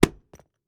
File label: cd wallet set down; prosoundfx